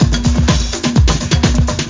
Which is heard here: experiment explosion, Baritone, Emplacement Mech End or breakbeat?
breakbeat